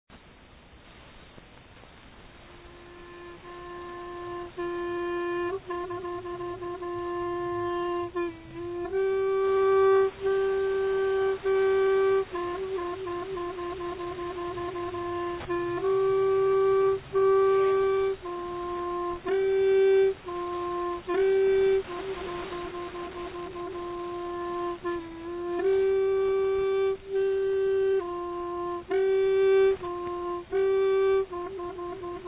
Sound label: Instrumental Music, Calling the Elephant Sanai; Champasak/2000